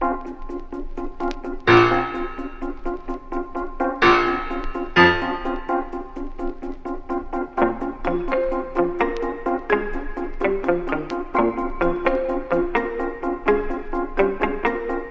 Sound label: piano; Phonky